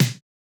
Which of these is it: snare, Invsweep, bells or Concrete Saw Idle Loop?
snare